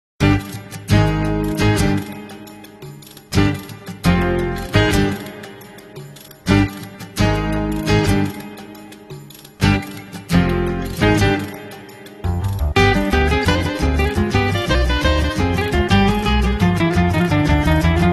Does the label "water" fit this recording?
No